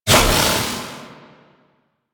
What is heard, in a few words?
Operator Wraith Void Projection Cast; Warframe; Focus; Powers; Wraith Movement